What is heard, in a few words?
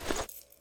crouch out; AssaultCube